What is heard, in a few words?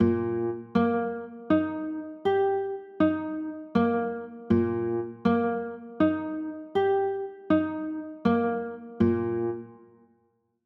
e flat maj, chords